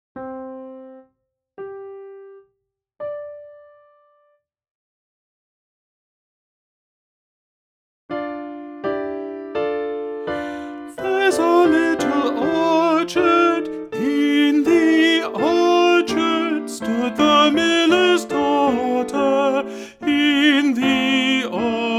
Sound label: Alto